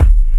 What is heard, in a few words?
kick